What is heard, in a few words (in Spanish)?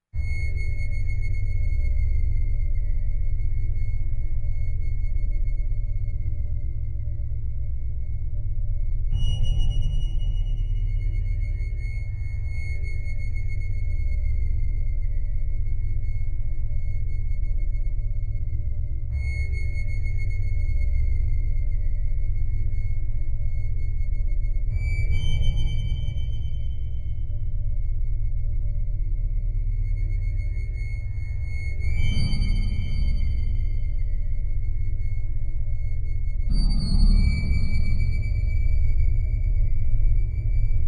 Música de Suspenso; Sonidos de Suspenso